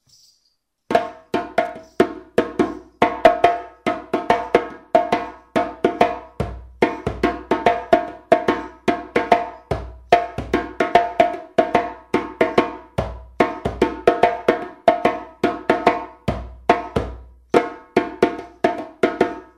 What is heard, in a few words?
Djansa, djembe